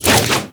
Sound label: Flip Whoosh C, Foley; Enemies; Warframe; Teshin; DE